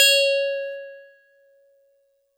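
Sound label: StarPiano DX100 C#0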